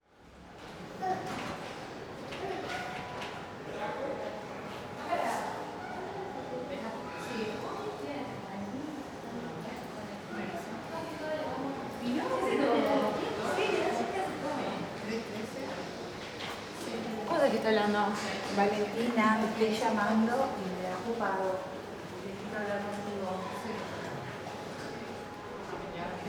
AMB INT pasillo Sanatorio gente murmullo; Casmu

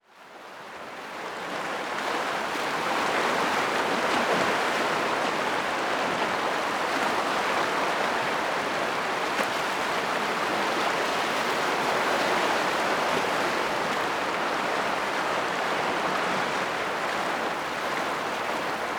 AMB EXT NOCHE MAR Pta Ballena UY Mar rocas olas

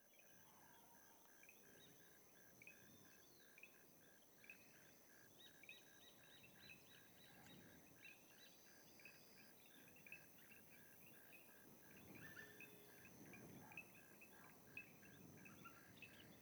AMB EXT ATARDECER CAMPO PUEBLO Piedra Sola UY Generico teros auto pasando

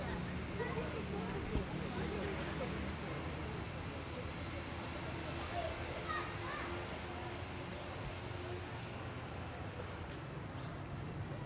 AMB EXT ATARDECER PLAZA Paysandu UY

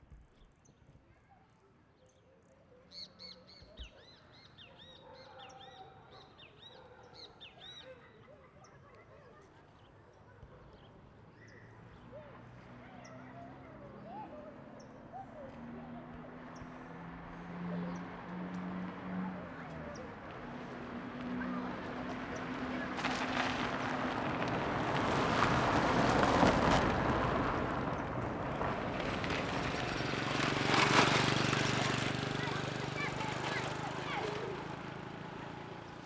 AMB EXT TARDE PUEBLO, Transito ninos; P de los Toros UY

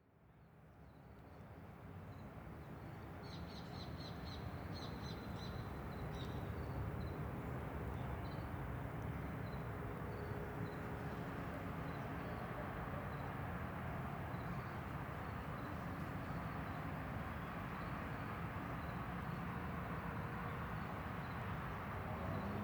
AMB EXT MANANA CIUDAD LAGO UY Pajaros y transito lejos

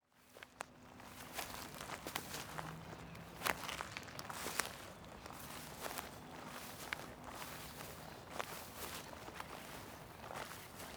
PASOS sobre PASTO

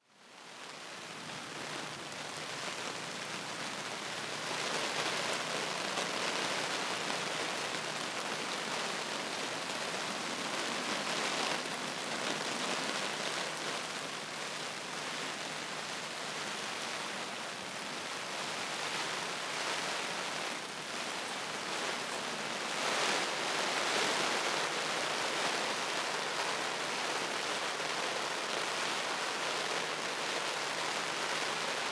AMB EXT DIA CIUDAD LLUVIA sobre toldo CERCA Y LEJOS

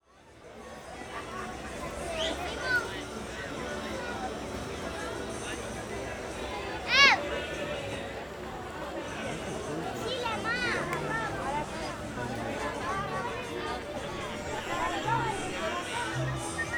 AMB EXT DIA CAMPING Corrientes ARG Gente musica gritos; Gauchito Gil

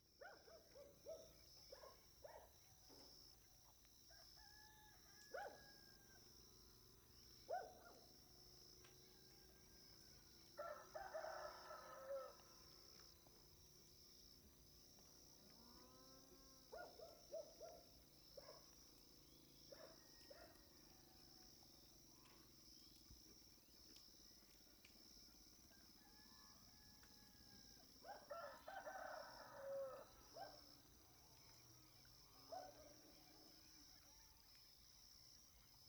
AMB EXT AMANECER NOCHE CAMPO PUEBLO Piedra Sola UY perros ganado gallos teros moto a lo le

